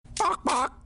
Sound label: Bawk Bawk; Archer